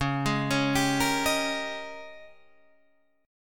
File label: G sharp Major 7th Suspended 2nd Suspended 4th